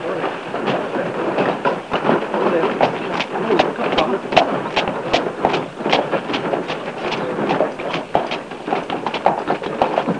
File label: TOWN; Klondike Gold - An Interactive History